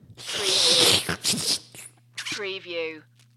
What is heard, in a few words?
Slurp